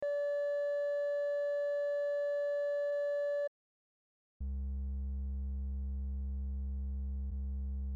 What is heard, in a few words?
ducking examples